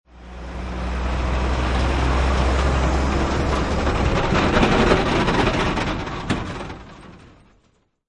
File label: Truck Semi Drive By Left To Right, Semi truck drive by. Rattling.; Auto, truck, motor